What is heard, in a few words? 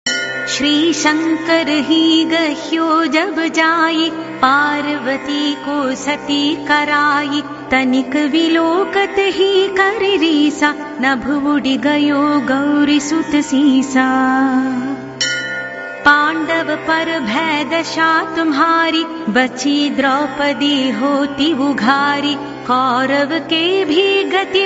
shani chalisa; deities